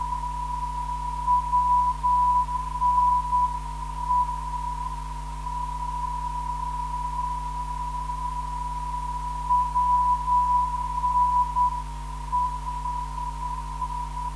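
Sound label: non-directional beacon; WNE; 398kHz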